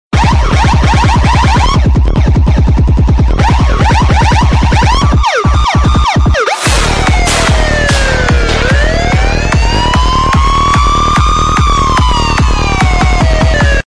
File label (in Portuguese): toque de sirene policia remixado; toques para celular